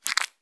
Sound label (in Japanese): くちゅ音単発